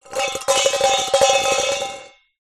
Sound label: foley metal bowl shake movement plastic object inside rattle move